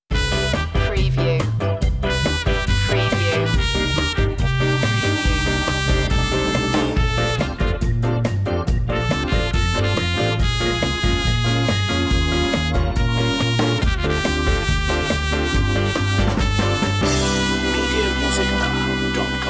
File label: jet set ska